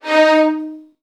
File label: VN 16TH, 4D#1; ILIO; Kirk Hunter